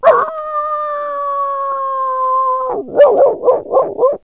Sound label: dog howl; animals; Wired for Sound Pro CD; Aristosoft, Inc.